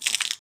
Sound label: splash; water splash slime sfx